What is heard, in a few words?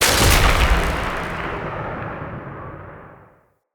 Tesla Mini Game Shot; Encounters; Ode Pollution Tower